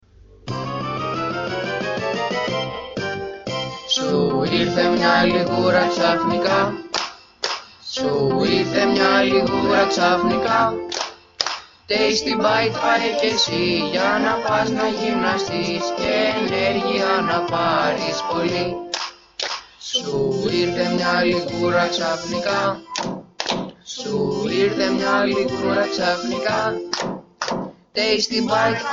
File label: JINGLE